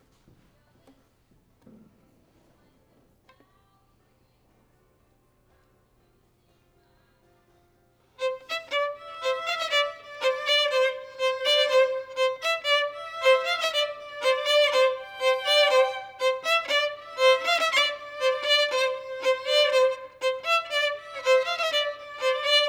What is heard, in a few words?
violin